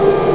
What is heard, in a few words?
Game, 游戏音效; 特酷声音素材库